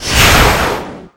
attack

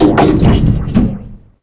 door close

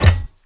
damage

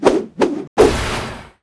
Wield; Gamigo

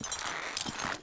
Orc Captain Idle

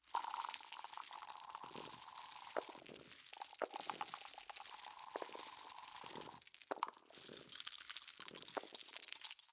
Dice Zone

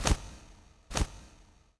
Bat Run

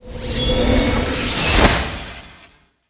Skill Dischrage

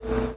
Hover Board Run; Seer; GamigoNA

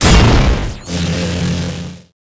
Final Light; Seer; GamigoNA

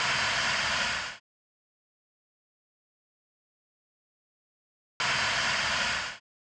Steam; Seer; GamigoNA

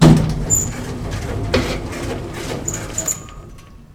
door open

